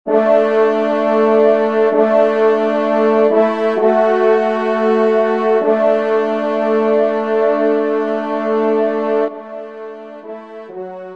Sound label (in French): Offertoire TRP; Devert; Offertoire 1e Messe